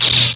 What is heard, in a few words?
lt saber hit, sabre laser; effets speciaux